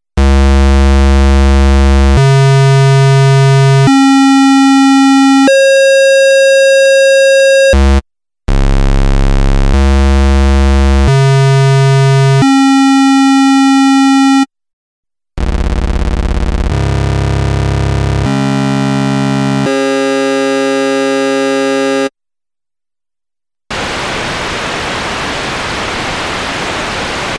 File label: sub, suboscillator; MFB nanozwerg